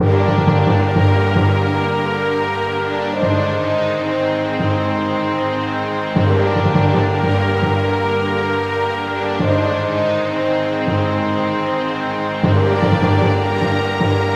DEATH; albion

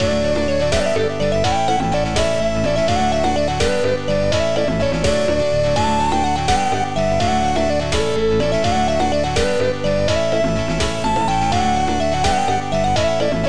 HAPPY; kik

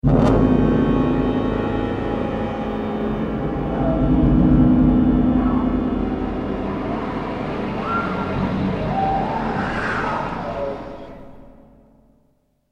Lose Combat Tag; wiz8

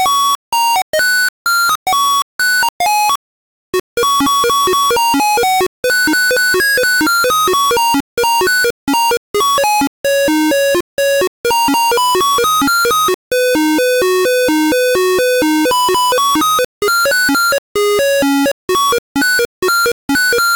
frog; frogger

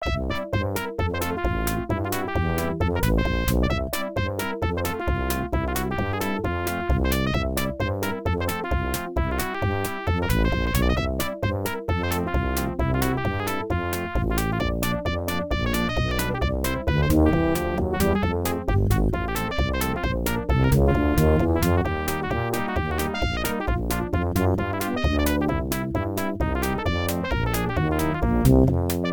Circus; cosmo

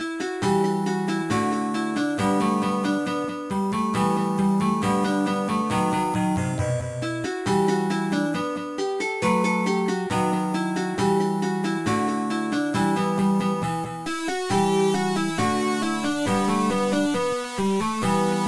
Rough Waterfront Tavern; ambermoon

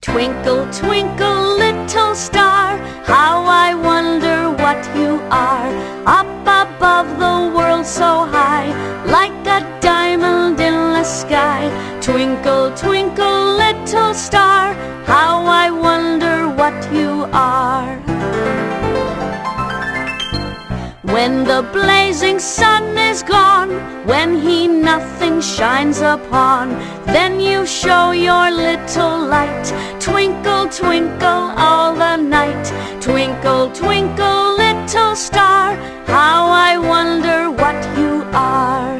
twinkle; preschool

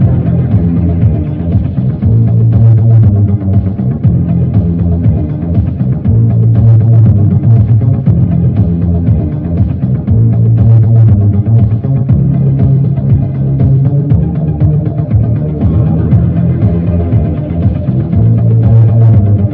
rowdy low; ionfury

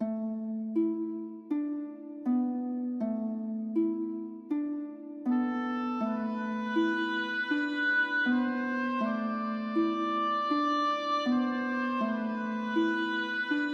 menu; forge

